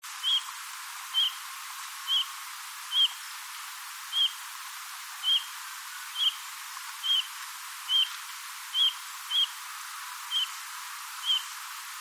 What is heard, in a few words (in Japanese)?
ojirobita